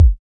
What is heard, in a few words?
Kick; Samplepack Produtique